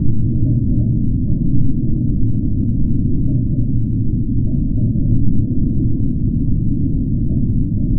Drone Ambient; Drums & Drones

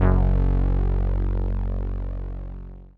505, String Machines

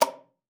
C5, alto sax; Xsample VOL-2

